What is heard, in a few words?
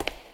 cave grounds step; Golden Crayon Sounds